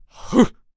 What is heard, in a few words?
Protector Attack Vocals